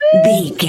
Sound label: whistle slide wobble notes short